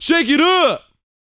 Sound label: VOCAL F; ILIO